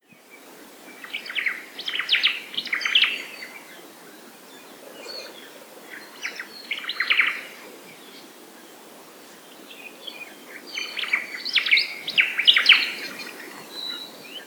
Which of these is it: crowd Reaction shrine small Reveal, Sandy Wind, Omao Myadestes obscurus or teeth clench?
Omao Myadestes obscurus